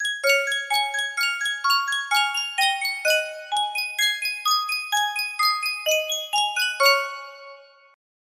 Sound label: Sankyo miniature music box